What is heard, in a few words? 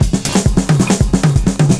FILLS, 70_s Breakbeats